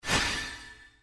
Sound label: heart levelup